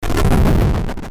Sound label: game over